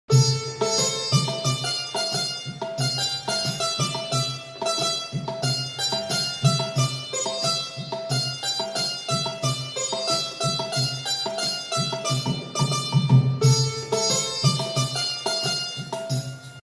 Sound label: devotional ringtone; bajrang bali